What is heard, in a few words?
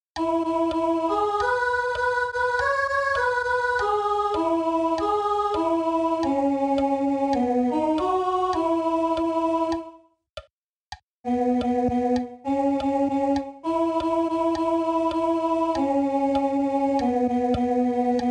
Santo, CONT; Santo Cabo Verde